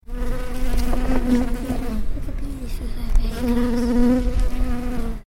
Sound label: buzzing bee